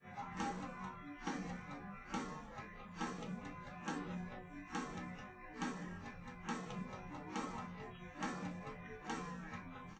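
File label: with drums live rec; SiSEC10; underdetermined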